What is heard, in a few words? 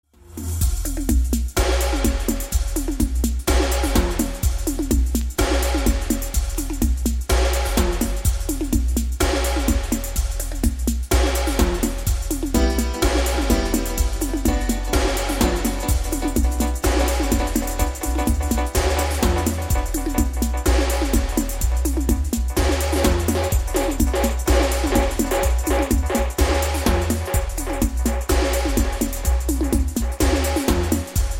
warning dub